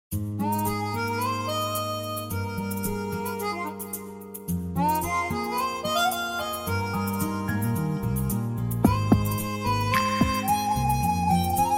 MALAYALAM VIDEO SONG l LOVE SONG Vocal Removed HD Karaoke Sample Track